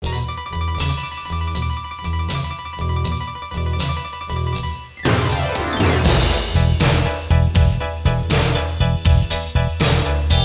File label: blues; Invenzioni E Inventori 1